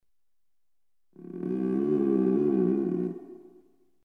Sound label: hungry stomach whale